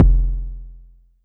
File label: BD; TRX